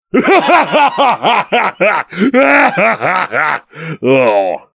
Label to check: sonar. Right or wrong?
Wrong